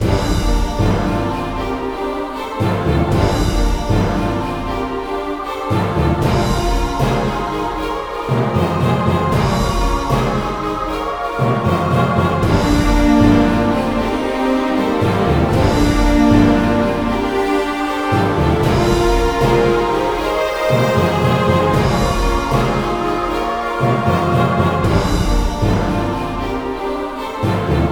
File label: Harmonic battle; gamerip; symphony of war the nephilim saga